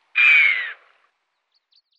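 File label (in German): Gämse Rupicapra rupicapra; Tierstimmen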